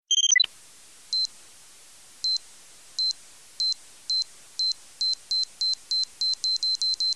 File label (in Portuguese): bomba relogio explodindo